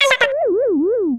stabbed; tf; isabelle

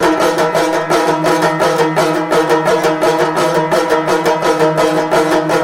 banjo; Left 4 Fortress; zombat

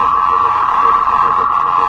charge loop, weapons gauss; hl2dm

